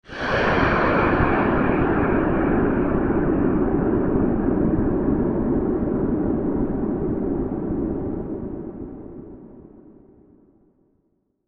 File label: Sweeper Filter Swell Dark; Production Element Imaging Element Accent Transition; Sweeper Sound Effects